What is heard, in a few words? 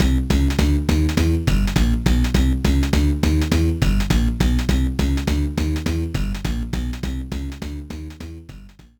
Bonus; N-Spade Bonus; Super Mario Advance 4; Super Mario Brothers 3